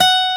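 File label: F# 4 B OVATION, acoustic guitar; AKAI S6000 CD-ROM - Volume 1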